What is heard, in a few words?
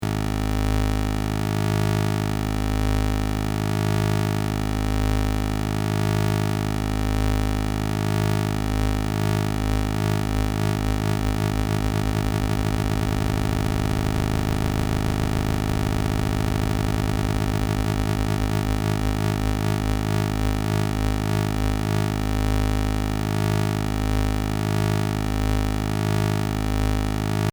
Wave Blending